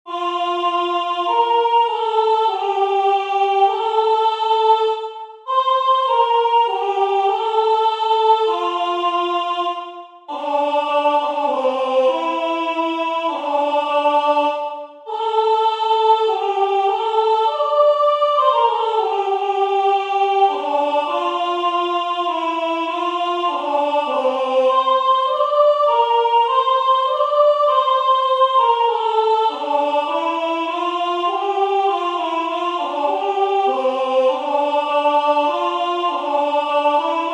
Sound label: Ave Maria Soprano; Monteverdi; Baroque